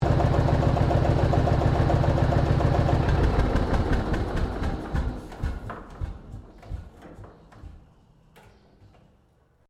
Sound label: Tractor Lance Bulldog Model Q 1954 shutdown, Old Farm Tractor; Tractors; Vehicles